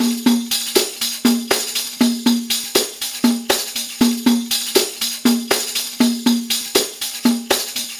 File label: PERLP, ArabianTraditions; USB Soundscan vol.43; AKAI